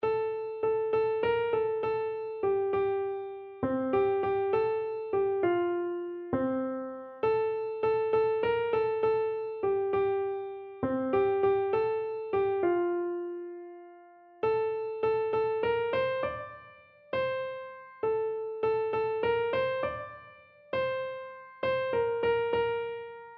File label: wonderful words, keyboard